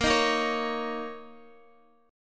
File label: B flat Minor Double Flat 5th Guitar, chord; Bbmbb5; strummed; x,x,8,8,10,8; JGuitar